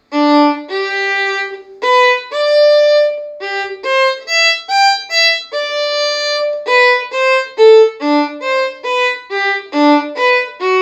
Happy Farmer

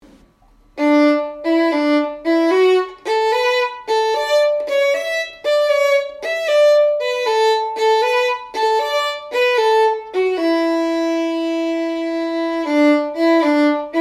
Humoresque

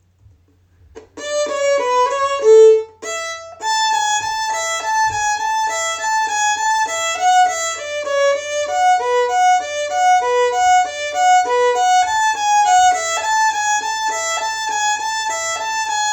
Melody; Devils Dream

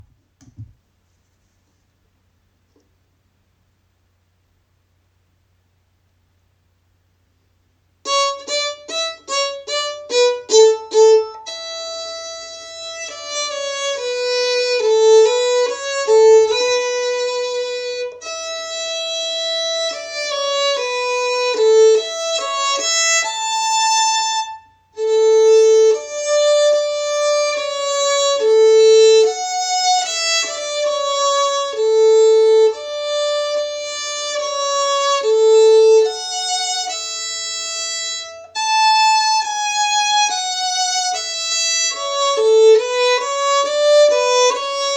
Perpetual Harmony

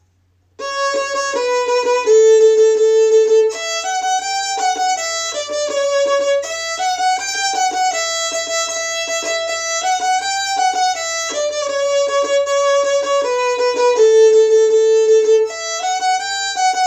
Melody

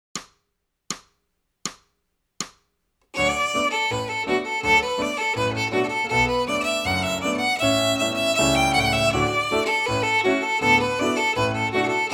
Merry Blacksmith Fast

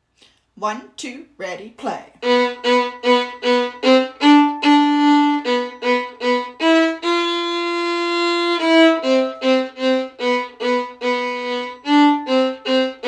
Jolly Harmony

